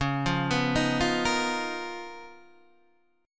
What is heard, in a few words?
C sharp 13th C sharp, Guitar, DADGAD